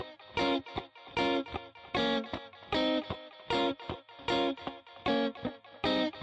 077 echo guitar skank; GUITAR LOOPS

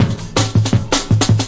162 teflon snare, drum loop; 161 to 180 bpm

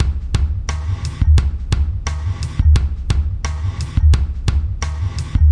87 bpm groove; the landing party

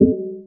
bass; SCARs FNC Bass

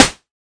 melbourne bounce Clap; Hip Hop; STYLE KITS; D3ron Melbourne Bounce Kit

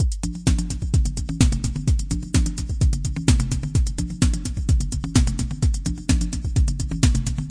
128 mellow bass pulse techno; SYNTH AND ELECTRONIC LOOPS

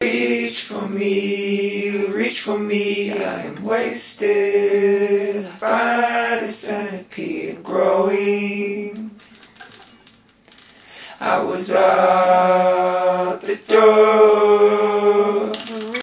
reach for me vocal, folksy; REMIXABLE COLLECTIONS; Folksy Guitar and Voice Stems

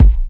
classic hh kick; Hip Hop; STYLE KITS; Classic Hip Hop Mega Kit

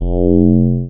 soft round, bass

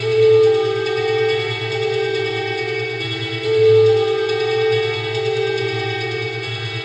arhythmic atmosphere guitar; GUITAR LOOPS

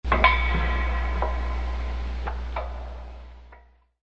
forklift, Factory Percussion; UNUSUAL KITS and FX

